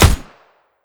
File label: smg fire; weapons; smg1